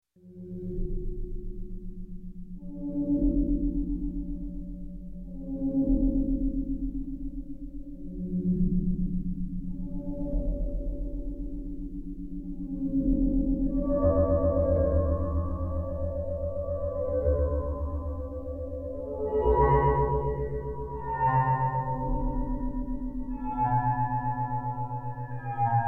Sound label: Space Mystery Tune, Electronic, Music